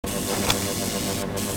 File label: stun rifle draw; weapons